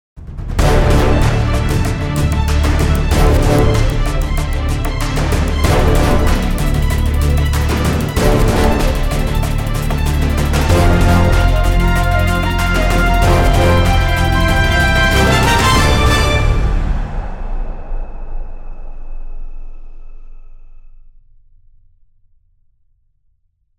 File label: Bold And Epic Sting